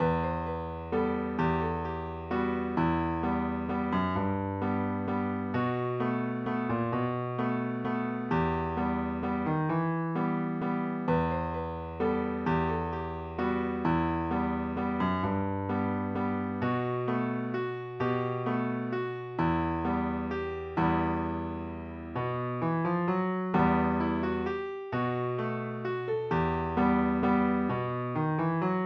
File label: moorish dance, guitar